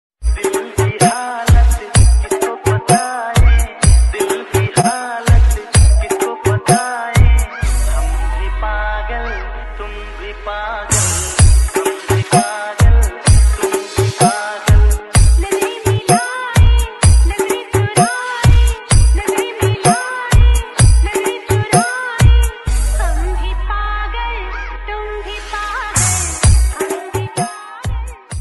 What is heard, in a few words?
Hindi Ringtone For Android Phone